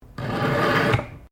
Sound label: KNIFE SHARPENER USE; Various knives; Kitchen (Sound Effects:Household)